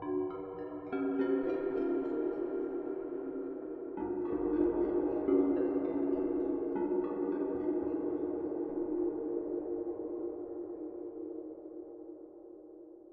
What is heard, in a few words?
Reverb bells